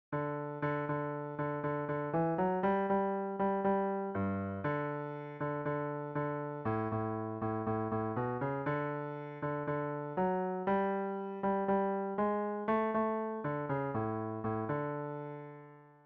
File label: Bass